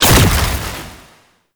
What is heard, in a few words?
Shield Activate; Warframe; Nineteeen Ninety Nine; PvPvE